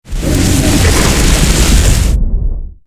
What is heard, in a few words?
death; horde; chill king; mobs